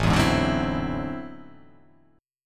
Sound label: A Minor Major 13th, down